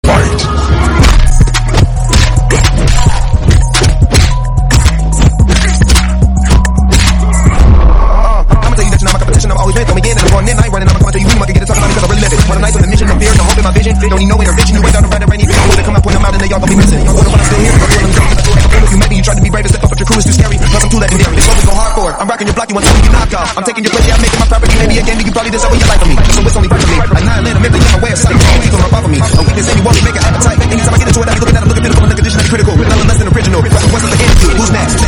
rave; mk10

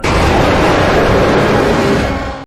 jumpscare sound; nightmare at charles 4

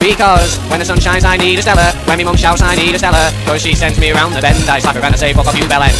funny

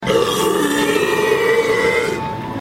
burp

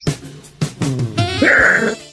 grrrr; dionysus

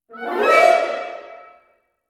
orchestra suspense accent stab

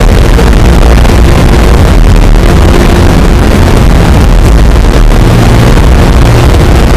bass boosted; ark

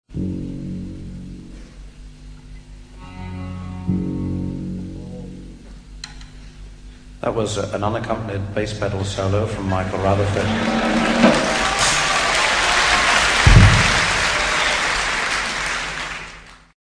an unaccompanied bass pedal solo

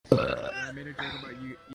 burp; khakis

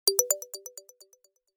incoming message

